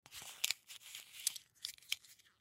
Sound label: Plastic Bottle Lid Crumple Protective Foil Seal; Lids; Blastwave; Foley